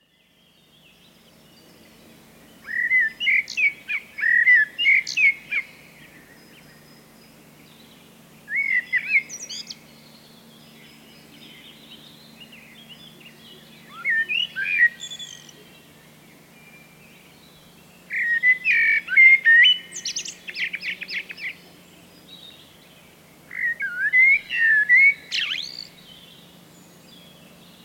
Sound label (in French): merle